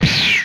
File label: Gun, retro arcade; Guns